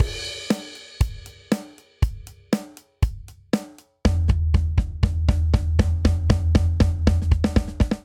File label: Classic Rock Buildup 119 bpm, Drum; 4 4; MIDI Clips; Ableton; Factory Packs; Drum Booth